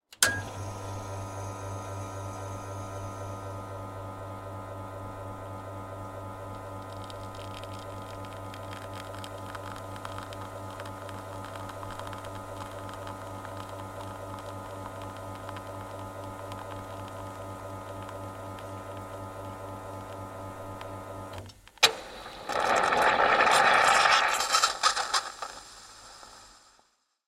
Bar and Coffee Shop Preparing Coffee Espresso Machine Humming Gurgling; SpillAudio